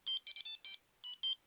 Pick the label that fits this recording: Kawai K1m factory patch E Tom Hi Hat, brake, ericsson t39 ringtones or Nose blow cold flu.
ericsson t39 ringtones